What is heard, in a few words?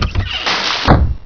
ICE CHEST